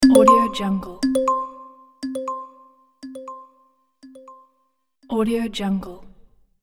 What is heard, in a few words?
calm pleasant notification